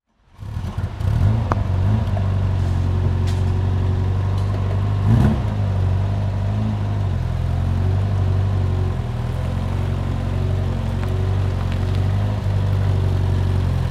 Glas 1300 GT

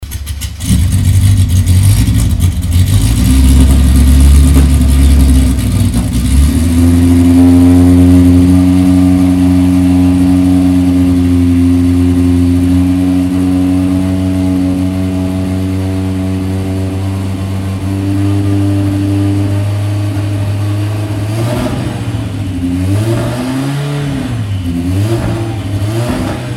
Porsche 924GTP; Porsche Soundnacht 2016

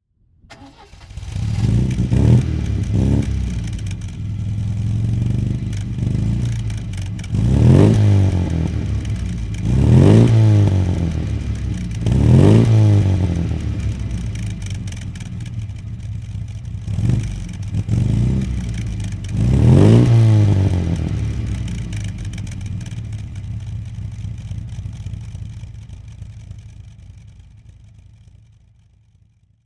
Gilbern GT 1800

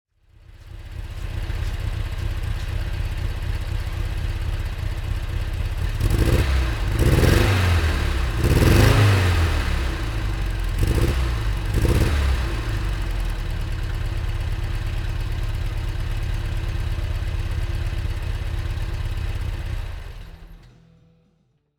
Lancia Ardea

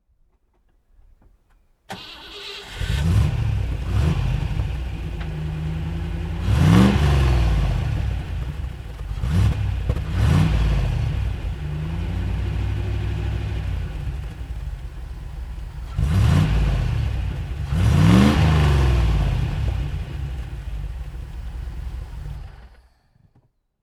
TVR 350i 1983

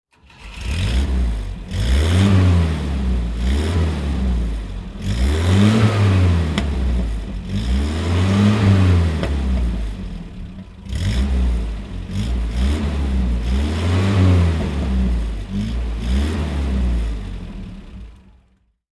Volvo L3314HT

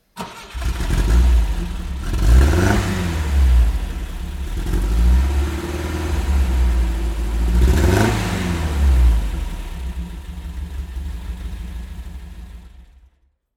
Volvo P 1800 ES

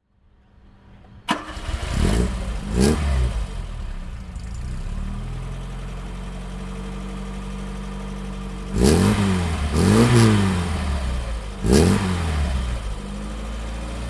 Opel Kadett 1100; Opel Kadett B 1100

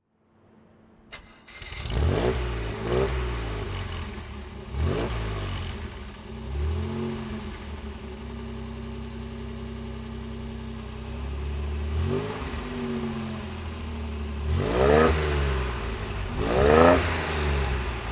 Lancia Flaminia GT; Flaminia GTL 2.8 Touring

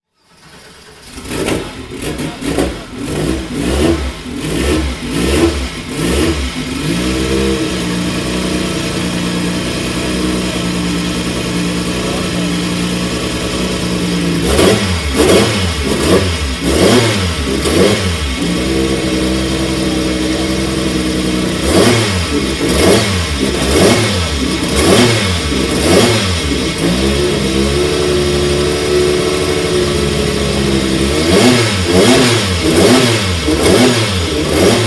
Porsche 962 C; Porsche Sound Nacht 2014

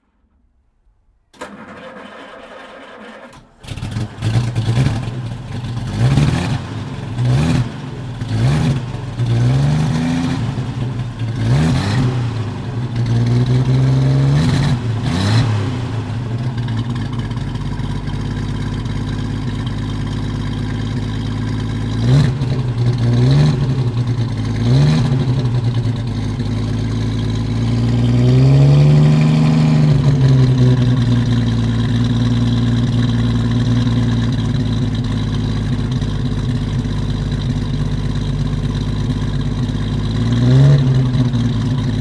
Talbot-Lago T120 Graber

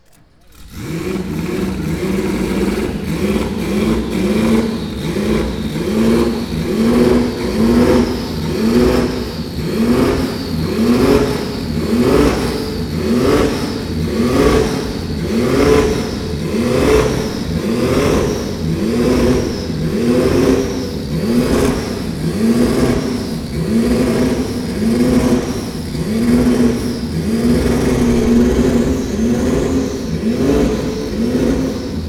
Porsche 911 RSR Turbo 2.1; Porsche Soundnacht 2018

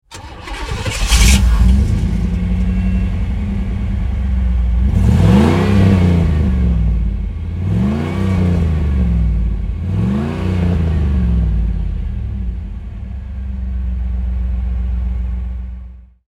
Pontiac Firebird; 3 Generation 1994

